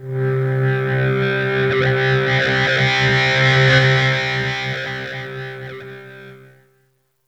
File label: Wah Gtr Start